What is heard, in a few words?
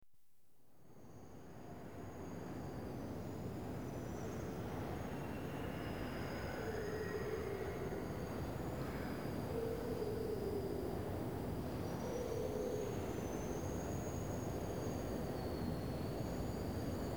London Underground Trains Quie; The BBC Sound Effects Library